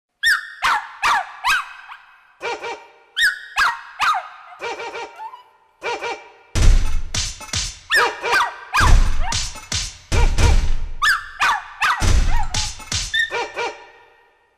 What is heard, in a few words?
the banger beat